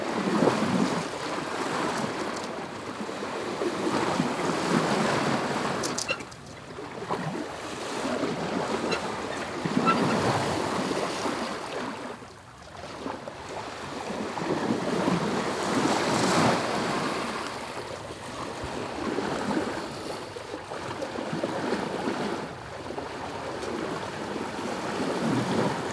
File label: Sailing